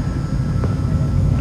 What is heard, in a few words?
Med Device; trek